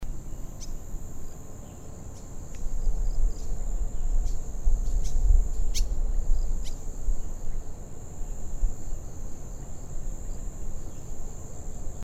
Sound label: 洋燕 Hirundo tahitica